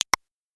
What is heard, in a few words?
click